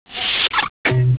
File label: tone